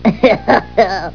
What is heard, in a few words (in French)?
rire